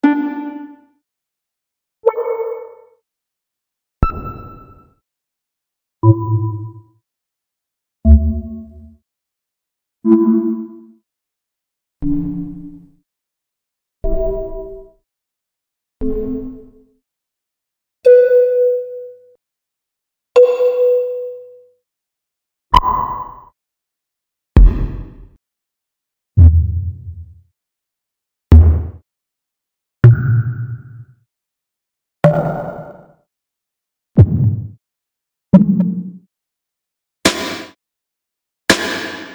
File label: Tenorion